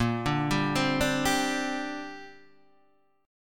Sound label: A sharp 6th Add 9th, Guitar DADGAD